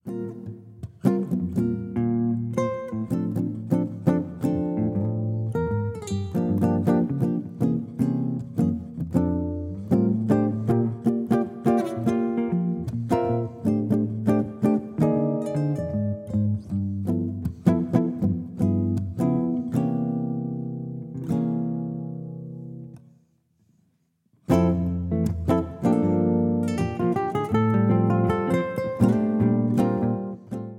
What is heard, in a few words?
waltzing